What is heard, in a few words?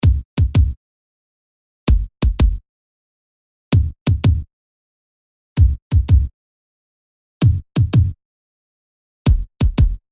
kick; drumodifier